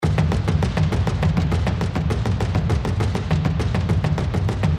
drummers Win Loop; tiki-beats; eyecon

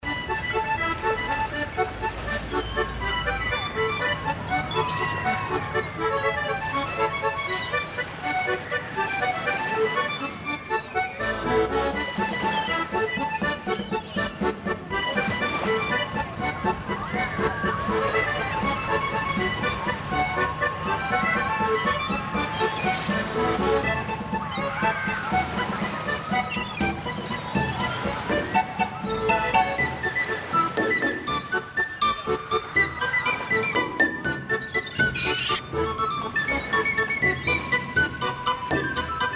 music; Lucky's Fish and Chips; free games

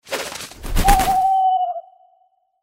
birds transition; Bouncy Balls 2

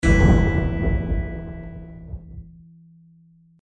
small Win; Champions of Valhalla

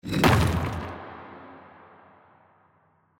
reel Spin stone Land; Champions of Valhalla

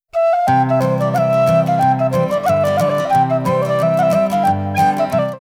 win symbol; Clover Rollover 2

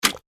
spin click; Fondant Favourites StarTrail; Eyecon

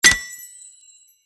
shot hit; Fluffy Arcade: Doubleup Ducks LuckyTap; Eyecon

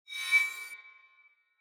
line Win Shine; Champions of Valhalla

